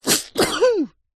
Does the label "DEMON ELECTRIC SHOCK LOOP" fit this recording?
No